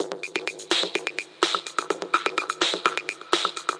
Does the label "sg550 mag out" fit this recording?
No